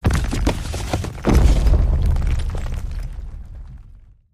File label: bonus outro; The Golden City